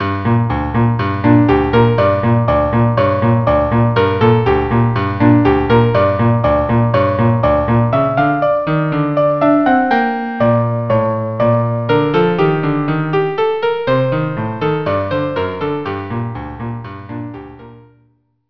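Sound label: viola cello; Khosn Kale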